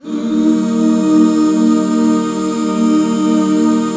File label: EB MAJ, Back Chords; Sound & Vision - Gigapack II CD 1